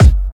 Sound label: hq original hip hop bass drum sound g key